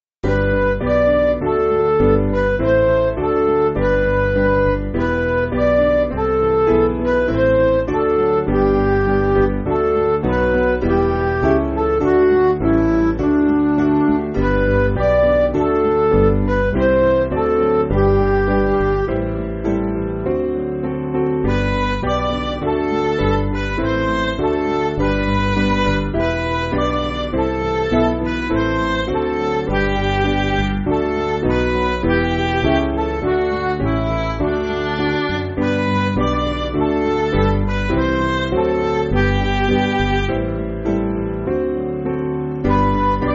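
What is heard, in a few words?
Midi, Music Backing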